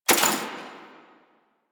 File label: Mech Alt, Rifle; Weapons; Warframe; Thanotech; Trumna Prime